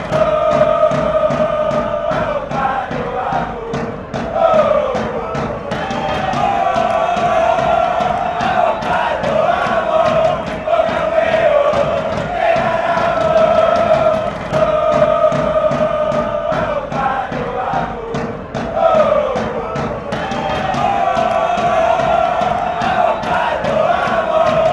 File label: oooh come on fanchants